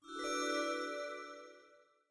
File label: bling; imperialriches